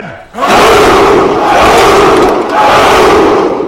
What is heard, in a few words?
awooh, hip hop ringtone